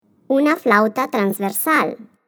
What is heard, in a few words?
FLUTE